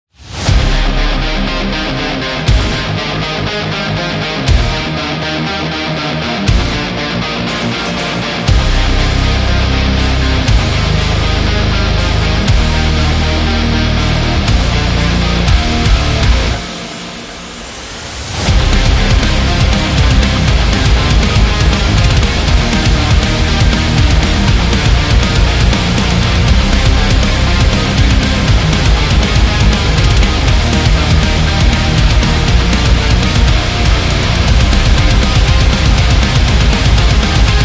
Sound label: Rush